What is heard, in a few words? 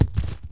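body fall small; The Elder Scrolls III - Morrowind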